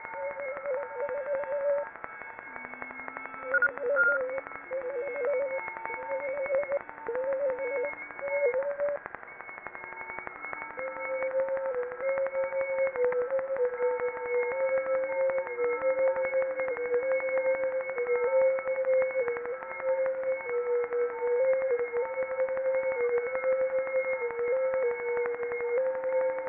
qrss audio various

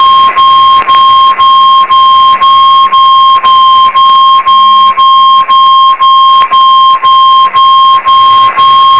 throb

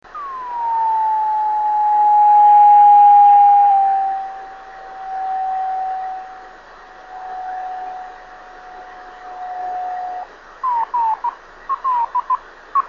27120KHz, driftnet beacons

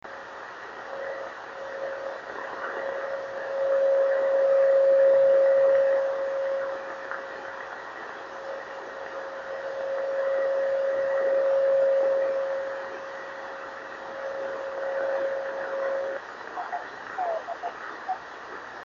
26800KHz, drift net beacons

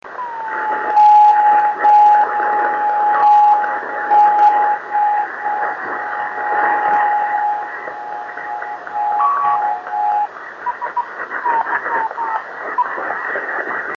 26590KHz

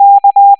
k tone

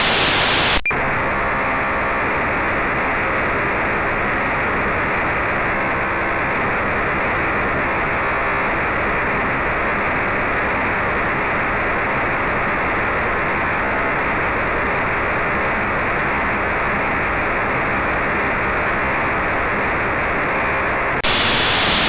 System Fusion; DN; Discr Out; yaesu

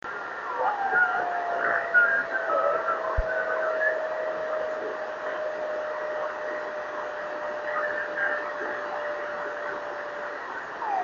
28326KHz

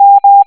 m tone

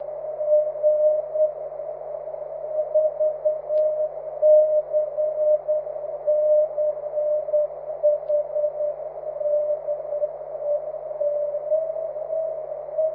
cw

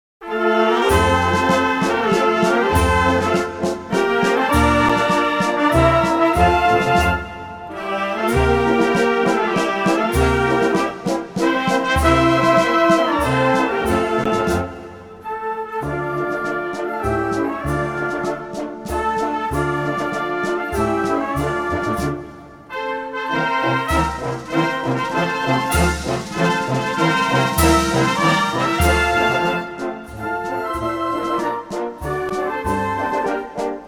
National Anthem of Greece

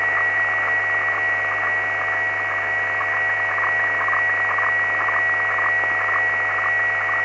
HF Fax

